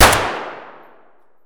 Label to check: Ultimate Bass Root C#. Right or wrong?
Wrong